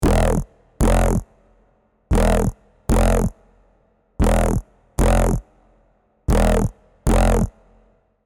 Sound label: bass; bionic mind